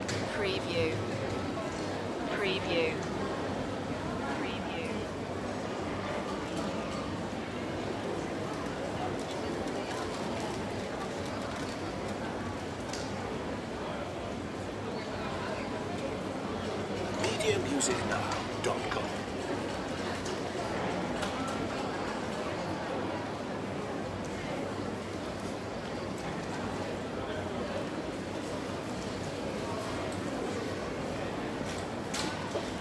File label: Gallery Crowd